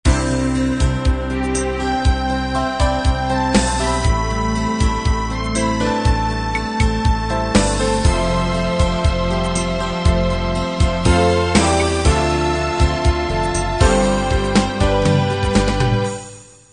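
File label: unplugged 8 beat intro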